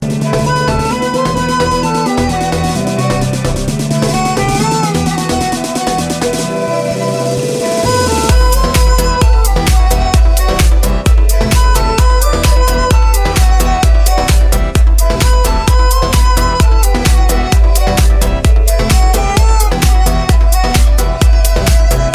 trance vs bollywood

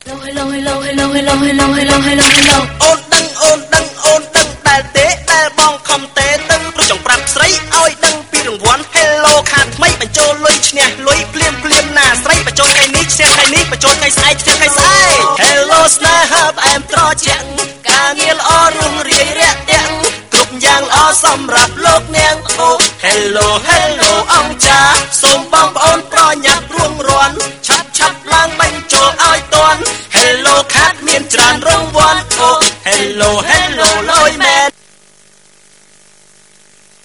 rap cambodia

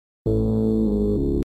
Text Tone

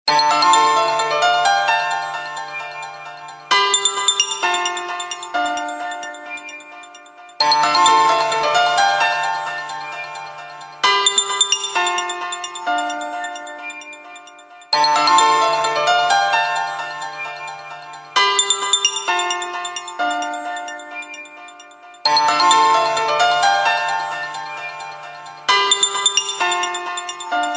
business ring

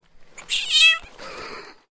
cat meow nervous

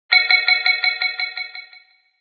ripple wave sms

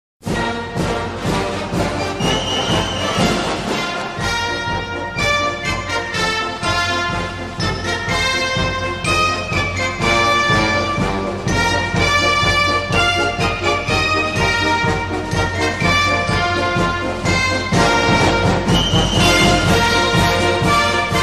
israel march ringtone

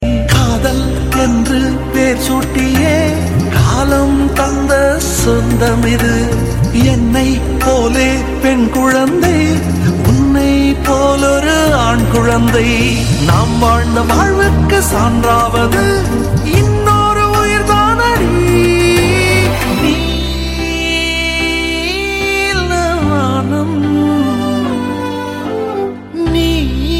melody

